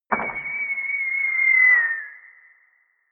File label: air strike